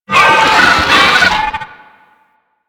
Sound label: Moa Charge Scream; Warframe